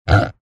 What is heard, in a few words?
pig oink cerdo